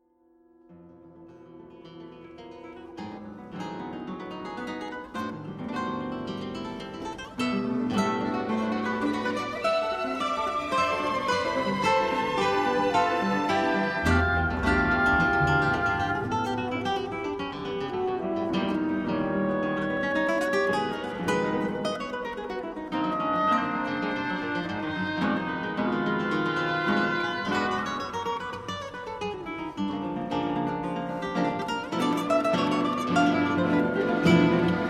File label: concerto for two guitars